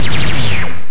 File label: GUN; Arrakis [game]; Occam; Trike